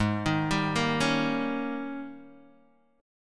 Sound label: Ab Major 7th Suspended 2nd Suspended 4th Ab, down, Guitar, Open D